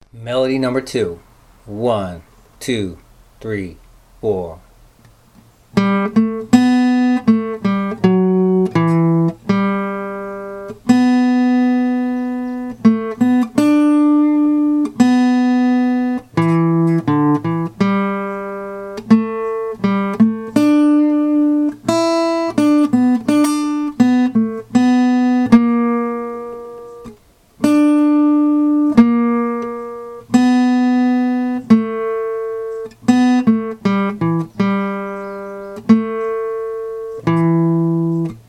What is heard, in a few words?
melody